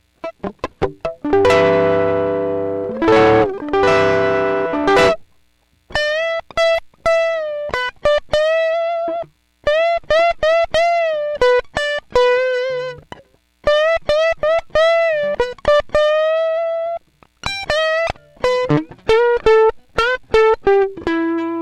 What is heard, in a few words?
Blues Noodling, Distortion